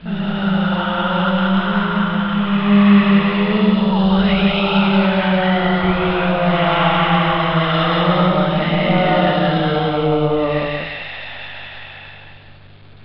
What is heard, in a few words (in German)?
Grusel gestoehne